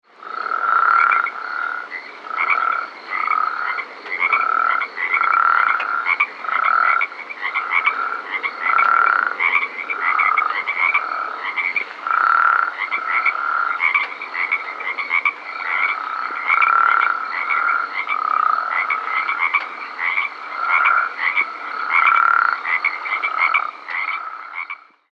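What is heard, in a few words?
Spea hammondii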